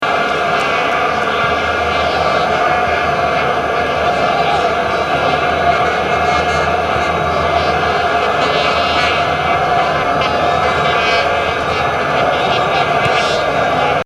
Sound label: vuvus